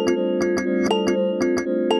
Static Looping; Inspired by Nature by Dillon Bastan; Vector Grain Presets

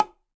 Bongo B H Heel; Latin Percussion; Drums; Drum Hits; Ableton; Factory Packs

